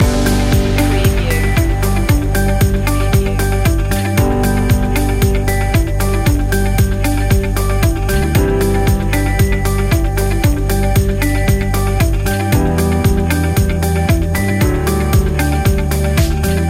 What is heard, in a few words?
storyteller loop